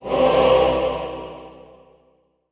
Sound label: Music Effect Choir, choirs